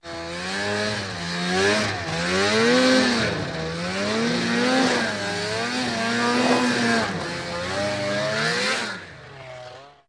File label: Snowmobile Maneuver